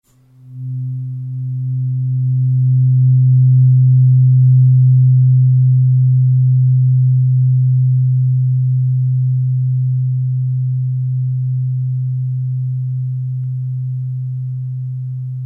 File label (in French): 128hz diapason thérapeutique; medson